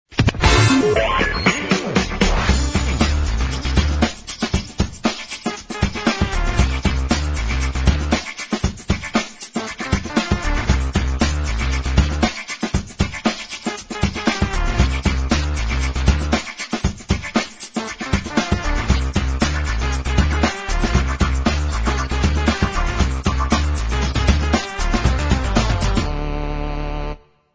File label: funky bed